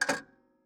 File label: PayPhone